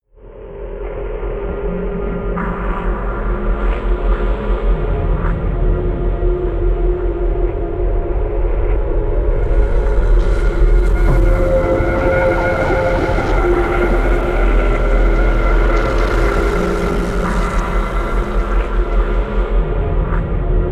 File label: crying with fear